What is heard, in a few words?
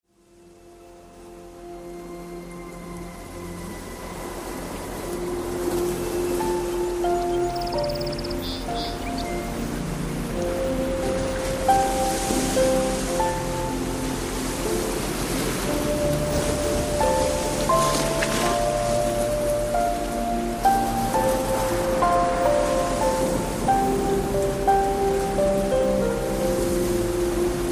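subliminal healing east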